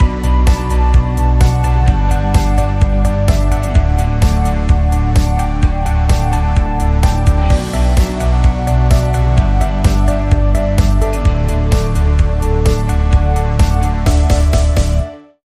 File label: Electro Pop